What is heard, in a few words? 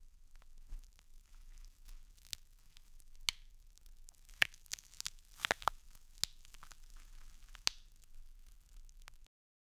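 heavy punch bones cracking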